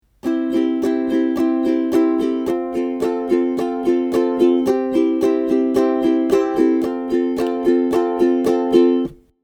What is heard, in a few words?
Down Ups, ukulele